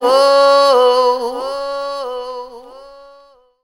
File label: "woah" SUNG